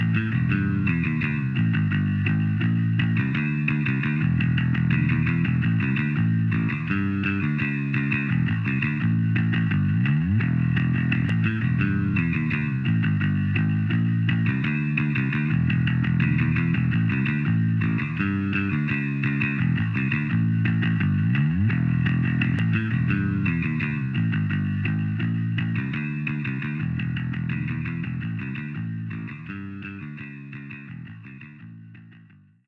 Jam Session; Splattack

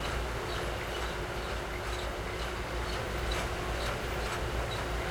Rumble Little

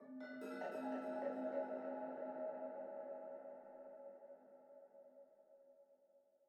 Env Field Night Cave

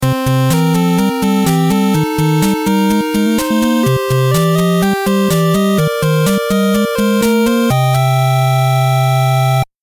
victory; Spelunky

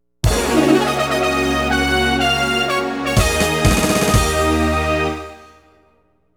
victory; Leaf Early Collection

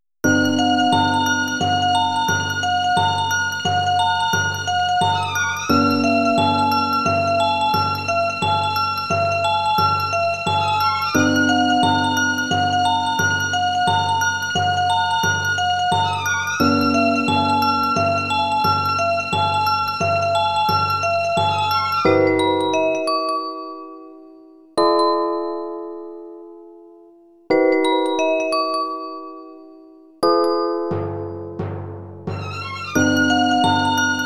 Tense String; lan original sound track 1999

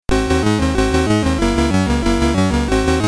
Bonus Scene; terrys big adventure; commodore 64

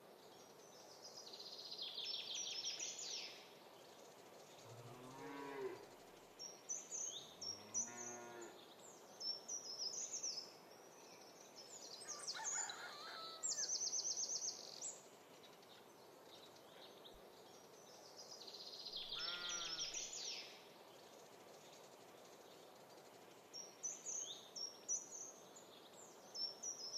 Ambience Autumn Fields 140bpm

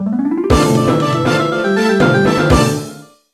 Battle Start; Crystal Monsters; Monster Chronicle; DSiWare

